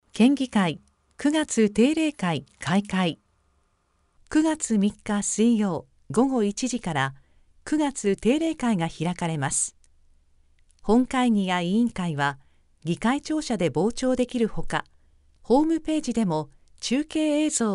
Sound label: concert; kengikai